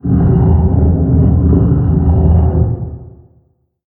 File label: Random Rock; moul-assets